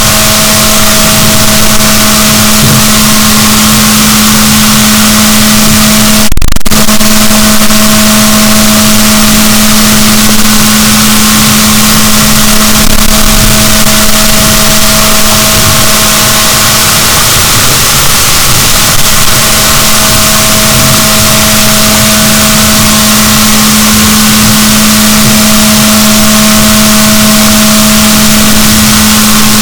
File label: rorquals negatif, boat